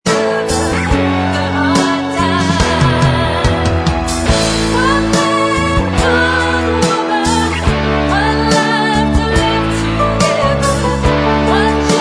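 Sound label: backing vocals, backing tracks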